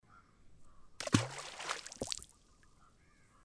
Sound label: STONE DROPPED INTO THE RIVER